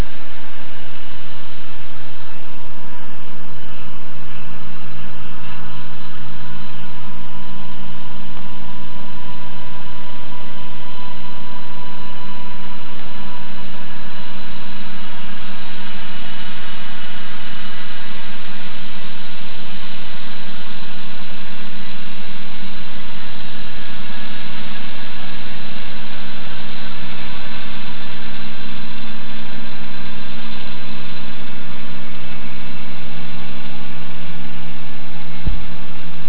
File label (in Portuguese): Gravação Draga e Veleiro